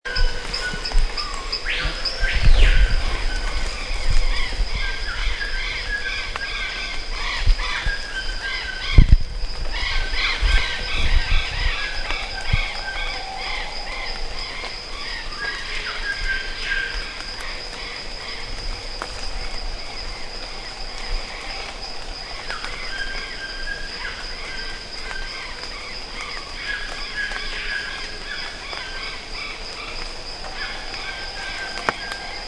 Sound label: golden parakeet